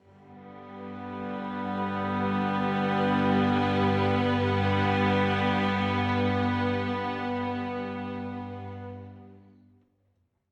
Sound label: Loop String Swells Gm Root; Goldenfields